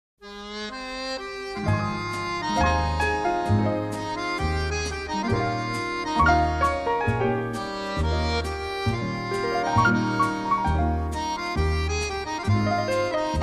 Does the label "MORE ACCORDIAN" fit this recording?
Yes